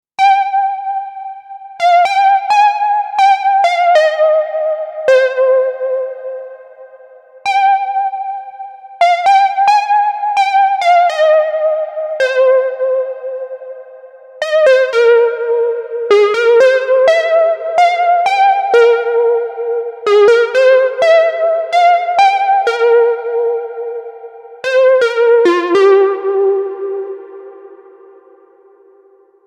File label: lead pulse